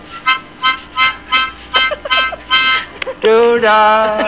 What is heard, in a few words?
Doodah harp